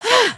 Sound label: surprised